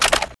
m4a1 clip out

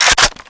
bolt pull, weapons M14EBR

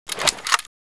draw, weapons

